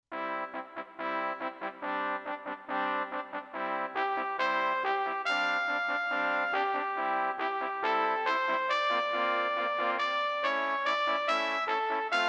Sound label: entrada synth; for "trumpet" or "brass"